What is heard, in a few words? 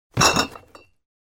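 put a box down sharply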